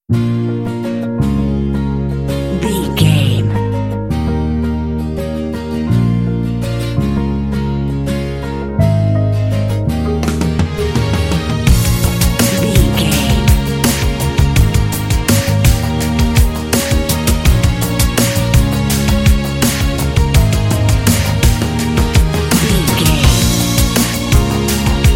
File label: sexy lounge spy cinema